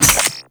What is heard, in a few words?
Survival Pickup; Warframe